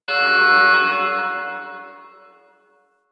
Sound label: Rescue Alarm; Missions; Corpus